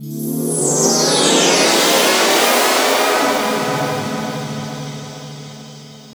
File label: CHORD, 160 BPM, Drum & Bass; Ueberschall; Drum & Bass Flash Trackz